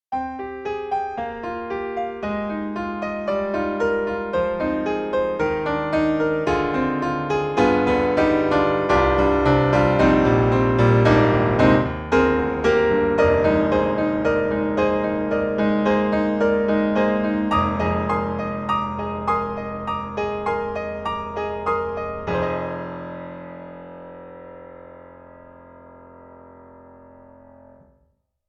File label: Bosendorfer 290 Player Rehearsal Room; Steinberg The Grand 3